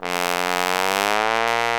POSAUNE; Alpine Volksmusik

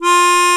MUNDHARMO; Alpine Volksmusik

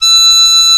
MUNDHARMO VIB; Alpine Volksmusik

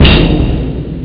pauke; cadaver; Amiga Soundtracker Sample Packs

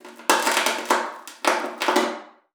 KNUSPER, VIOLA FINGER; Xsample VOL-5

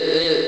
groll; ST-XX Amiga Soundtracker Sample Packs

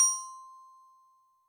Glocken H, Yamaha DTXpress misc voices

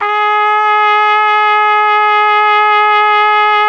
TROMPETE G#2, TRUMPET; Best Service; World Colours

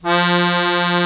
AKK VIOLIN F, akkordeon; Best Service; Alpine Volksmusik

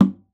KLOPF, GUITAR FX; Xsample VOL-2

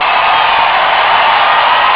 zuschauer; Amiga Soundtracker Sample Packs

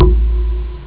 tropfen; ST-XX Amiga Soundtracker Sample Packs

